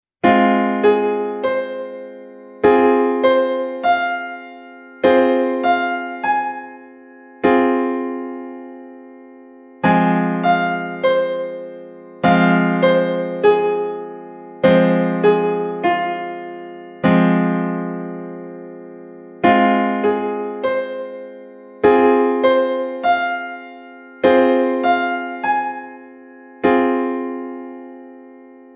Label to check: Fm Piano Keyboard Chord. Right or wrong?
Right